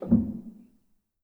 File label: rel, Grand